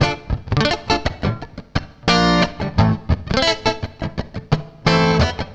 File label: CLEAN, CLEAN GTR